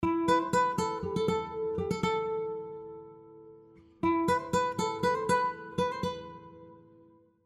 Scoring Acoustic Guitars Melodic Pulses And Phrases